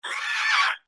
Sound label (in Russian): Звук динозавра